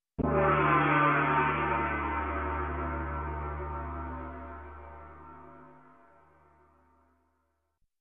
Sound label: Gong Hit Mono